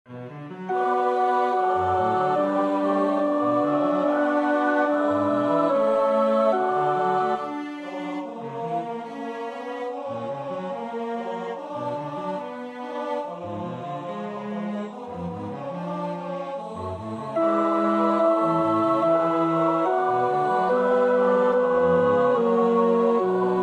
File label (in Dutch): tenor, koorpartij oefening; Bach (Johann Sebastiaan); BWV 178